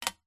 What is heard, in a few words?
industrial screw metal set down on metal surface; zapsplat